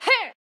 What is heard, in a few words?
Swipe Normal; Jackie; Going Under